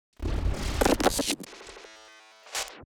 Dial up; glitch

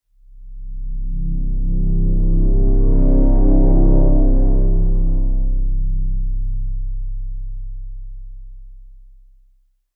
Brassy Swell; Evolve; brassy rise

